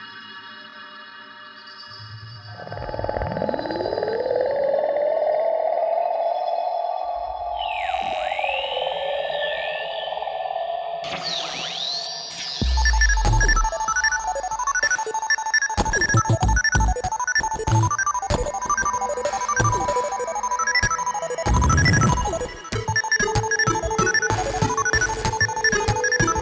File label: Music Space Night